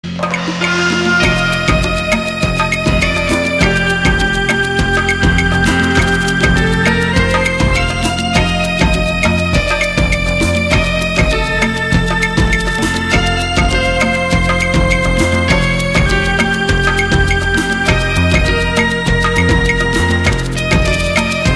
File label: synth